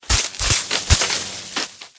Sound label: Electric; blue archive